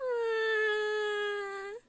うーん, 感嘆

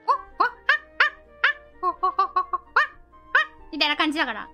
猿の鳴き声; 壺

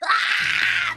嗚咽; 情けない声

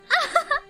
あははっ; マイクラ

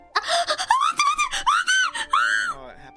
首絞めボイス; 壺

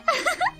あははっ; マイクラ

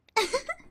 うふふっ; 壺

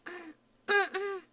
咳; Flashpoint Ultimate 13; 咳・くしゃみ

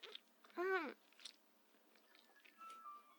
咀嚼

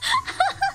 ｗｗｗ, 笑い声

